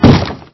wood, mob zombie; ayuncraft-1.5

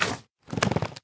jump, magmacube; minecraft; mob

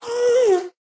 moan, ghast; minecraft

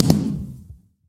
large Blast, fireworks; ayuncraft-1.5

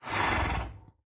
idle, horse; minecraft

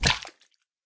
land hit, guardian; minecraft; mob

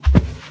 step, cow; minecraft; mob

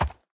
soft, horse; minecraft

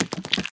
step, spider; minecraft; mob

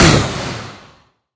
hurt, wither; minecraft; mob

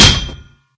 metal, zombie; minecraft; mob

